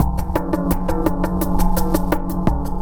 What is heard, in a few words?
MODULATED TRIBAL GROOVE; Sonic Foundry (Sony Creative Software); Vir Unis Infusion